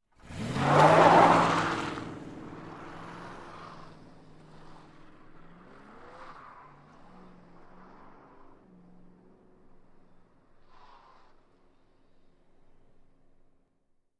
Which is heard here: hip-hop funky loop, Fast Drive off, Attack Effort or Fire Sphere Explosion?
Fast Drive off